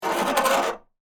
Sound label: Squeak Turn Metal, Pole; Texture; Squeak Sound Effects; Squeaks (Sound Effects:Objects)